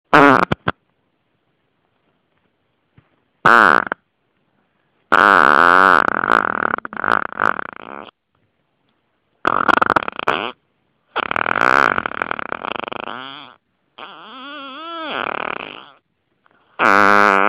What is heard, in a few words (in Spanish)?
Pedo; de la bisbal de falset